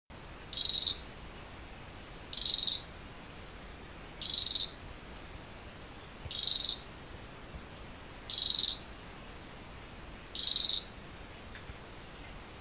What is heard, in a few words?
eleutherodactylus unicolor el yunque national forest puerto rico; Its peculiar and unmusical call is a short trill composed of six or seven fast notes; West Indian